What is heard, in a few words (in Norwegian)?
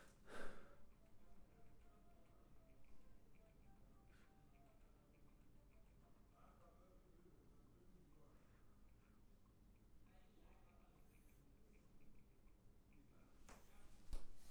frosk; logic